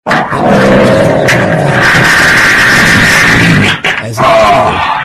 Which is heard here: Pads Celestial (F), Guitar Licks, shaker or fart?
fart